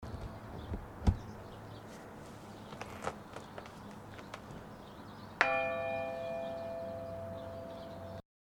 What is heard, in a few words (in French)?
cloche